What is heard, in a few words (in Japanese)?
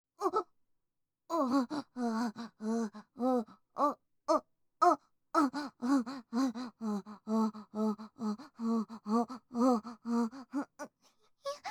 オホ声; SEなし